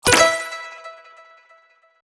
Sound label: Complex tonal notification